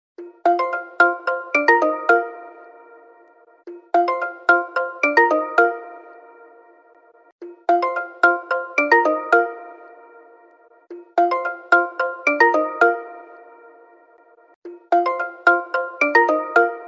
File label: ringtone